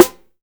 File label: snare single hit f key; snare drum samples; single hits one shots